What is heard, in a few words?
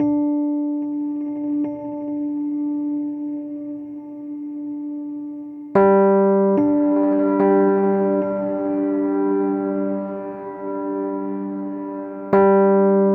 Ambient Keys; Sonic Foundry (Sony Creative Software); Cinematic Funk Urban Tone Poems; Session 05 - The Triad